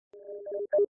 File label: steam